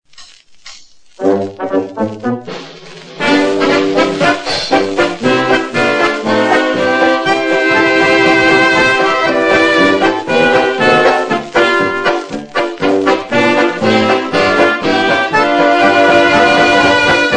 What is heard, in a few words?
polka; wnax; grainbelt